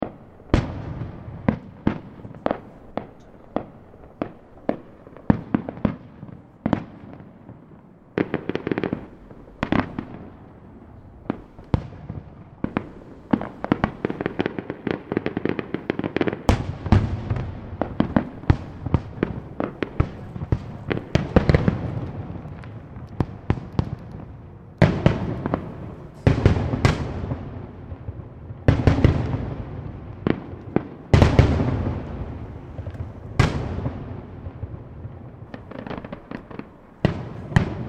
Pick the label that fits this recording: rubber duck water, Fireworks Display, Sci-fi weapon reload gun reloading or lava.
Fireworks Display